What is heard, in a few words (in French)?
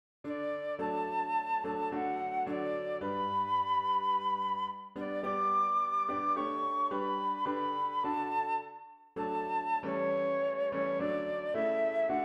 hymne national danemark; kra-yer hans ernst